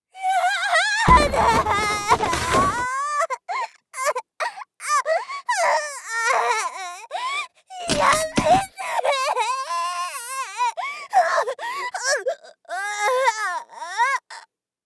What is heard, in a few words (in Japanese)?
抵抗(出来てない); 効果音なし